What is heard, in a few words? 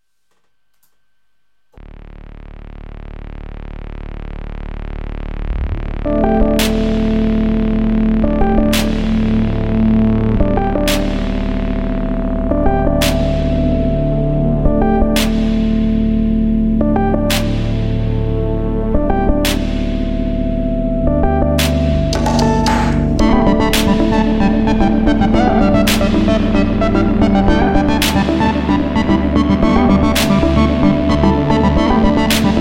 korg trident mkII